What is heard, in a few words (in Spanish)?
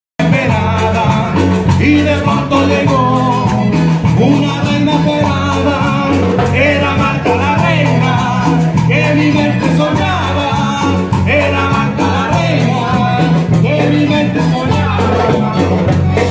Cumbia pirata